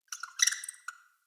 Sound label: Messenger Rat Chatter F; Ambient; Vocals; Verminia; Animals